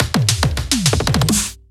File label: Riddim Drum Fill 140; Ghosthack; Riddim Essentials Volume 2